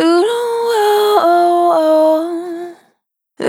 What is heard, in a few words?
Spin Dry, Single Voice Melodic Loops